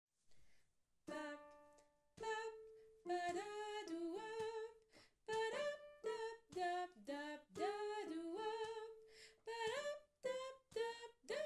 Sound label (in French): canon scats